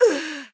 night officer damage, monster

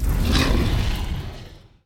dragonboss idle, monster

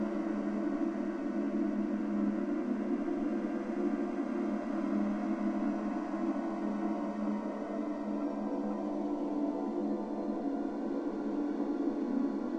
cemetery, snow

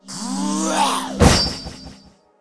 death knight attack

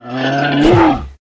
mummy attack, monster

